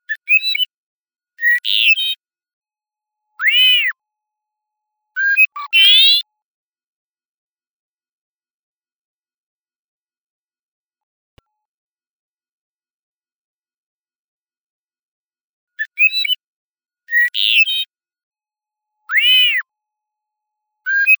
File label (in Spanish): canto, especies amenazadas; conservacion de especies amenazadas; biodiversidad